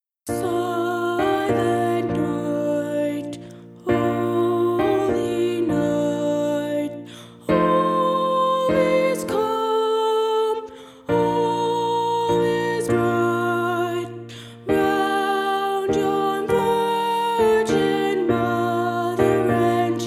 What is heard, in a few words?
Silent Night Soprano; christmas choir rehearsal tracks